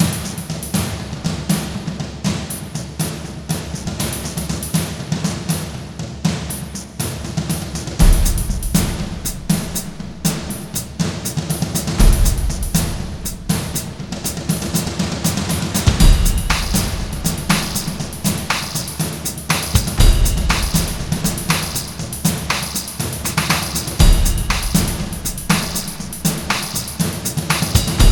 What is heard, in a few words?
earth drums; world